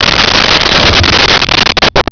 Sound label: door metal shut